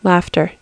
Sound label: laughter